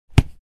Soccer ball being solidly kicked grass

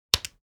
Large button

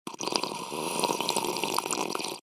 Sipping tea

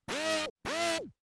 Robot arm moving

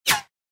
Bullet pass by

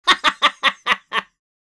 Crazy giggle laugh; shorts sound effects

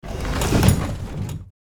Metal drawer full open; household

